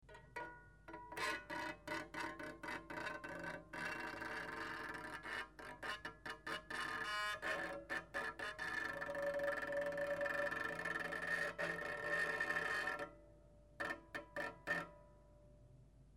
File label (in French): archet grince, harpe; touchers de la harpe